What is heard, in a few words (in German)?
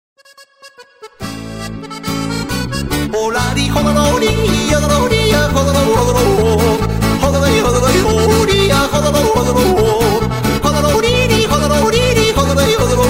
solojodler